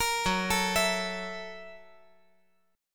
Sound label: F sharp 7th Sharp 9th; arpeggiated; JGuitar